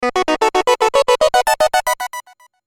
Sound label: Musical Digital, Musical Digital Beeps Delay Melody Ascend; Musical Sound Design; Blastwave